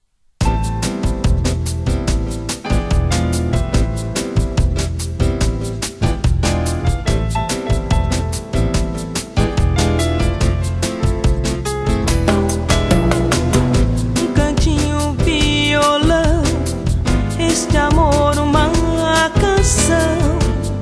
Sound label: Bossa nova